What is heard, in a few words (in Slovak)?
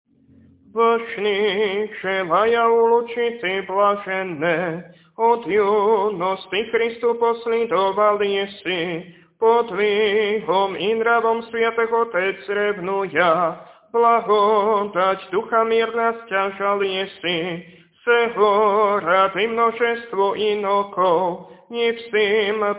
Kondák hlas 2; Kondák Antóniju; nedelna-skola